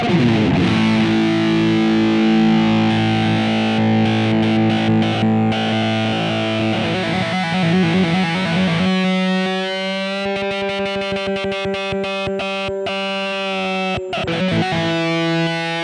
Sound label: Drift Momentary Switch; Spaceman Sputnik III